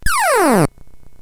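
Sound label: Nebulus, MOS 8580 SID chip music, games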